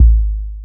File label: Kick, Trance; Sonic Foundry (Sony Creative Software); Trance NRG VOL-2; Drums